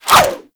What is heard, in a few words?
Sword And Whip Whoosh; Warframe; Weapons